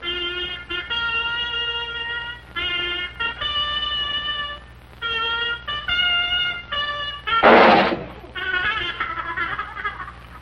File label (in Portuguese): morreu trompete